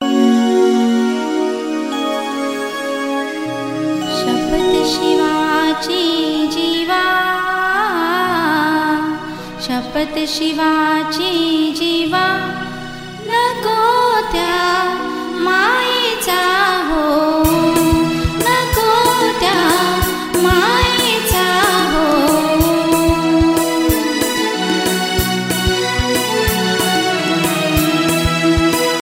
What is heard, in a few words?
marathi ringtone